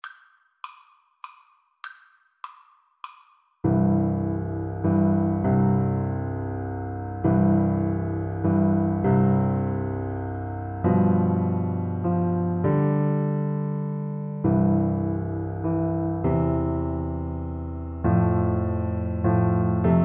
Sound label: silent night, tuba